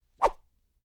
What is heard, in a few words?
Swing; Gameplay